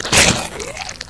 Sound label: bullsquid chew; hl2